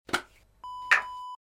deodorant can open

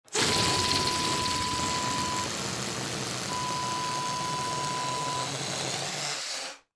balloon deflating

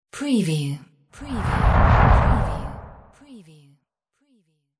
DISTORTED; windy beach audio

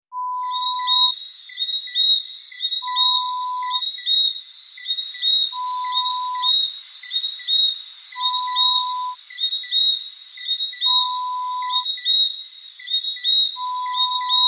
Jungle bird sound

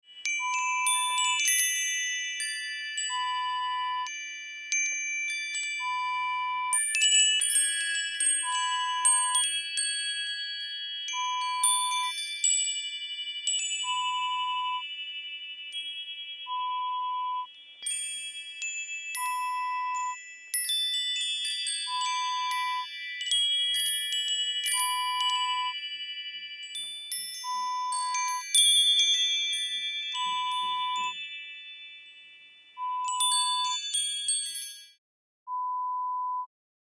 Wind Chimes sound

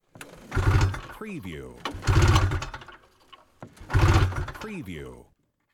snowmobile pull cord

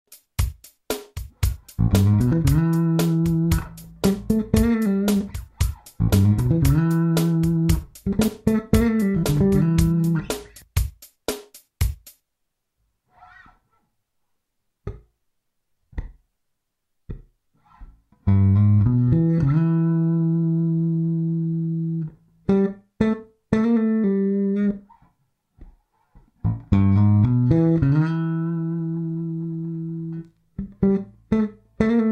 Blues lick E7

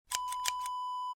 scissors snip

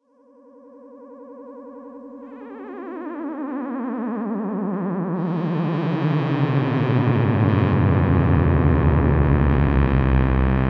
osc fall

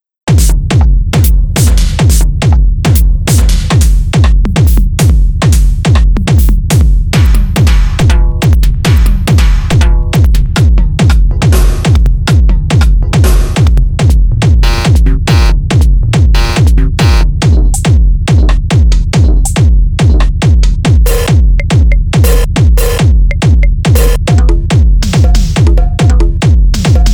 hard tech loops

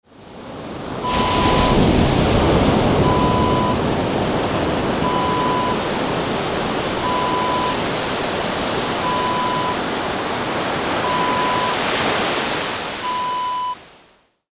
ocean wave

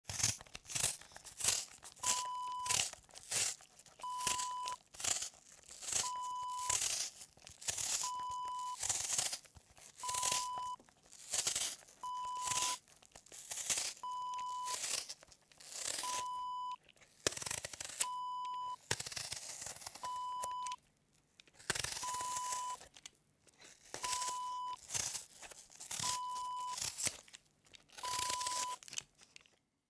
peeler

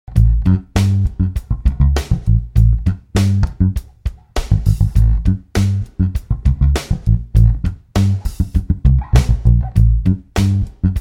Muted funk groove

